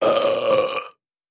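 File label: Large Belch A Little S, belching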